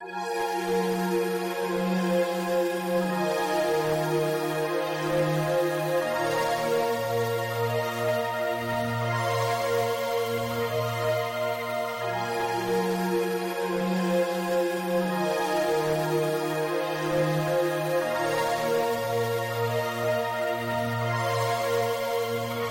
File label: pad; exogenous molecules